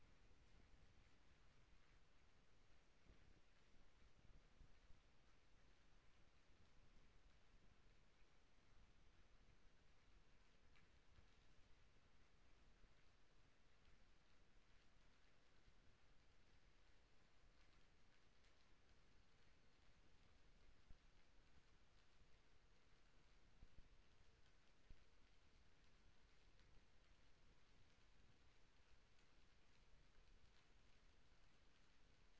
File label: HYDROPHONE 16000Hz; JASCO AMAR HYDROPHONE 740